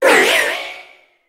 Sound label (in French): Cri Méga